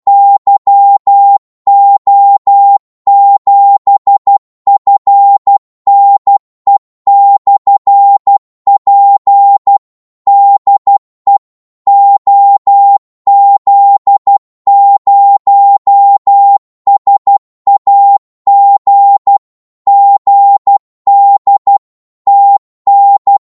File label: qso 12wpm, Morse